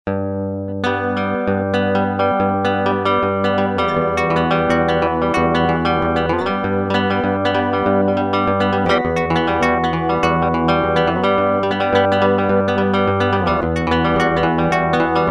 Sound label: guit bridge